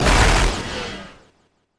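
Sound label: Production Element Hit Distort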